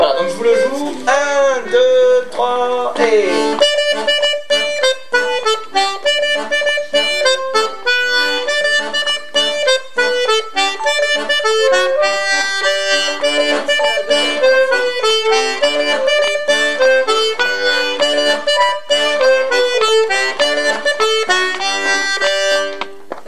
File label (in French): 2eme partie violons; Avant de s en aller